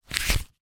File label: flip